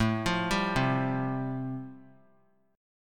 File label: A-Minor 7th Sharp 5th, chord; 21,24,22,22; Am7#5 arpeggiated; JGuitar